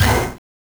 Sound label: Orchestra Hit; Genesis